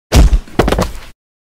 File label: Heavy object Hit and body thud; heavy impact thud